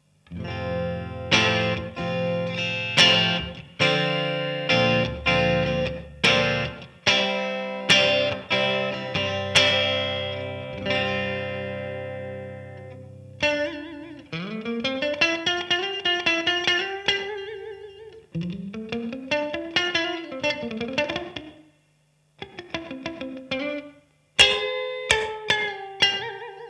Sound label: Randall RG50TC Acoustic